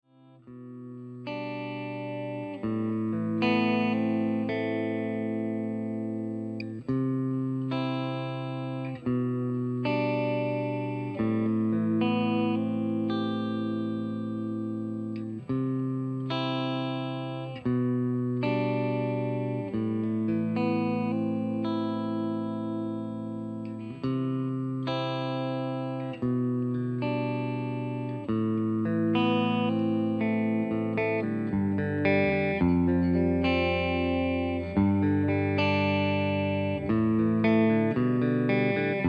elektrisch

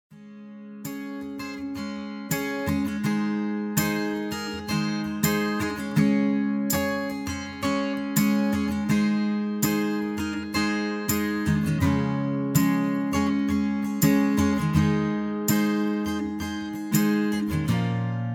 git perc

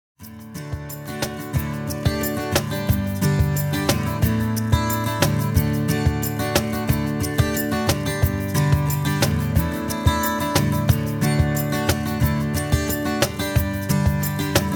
d-dur

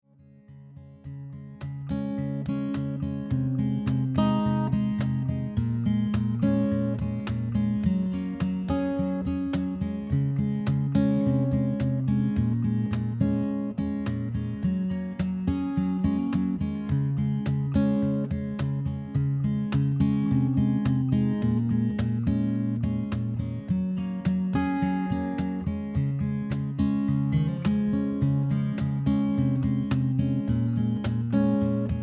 komplettband

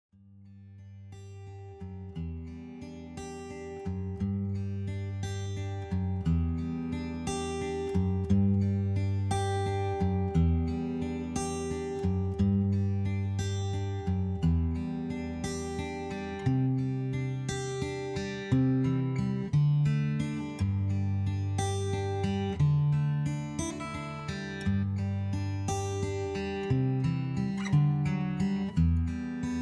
g-dur

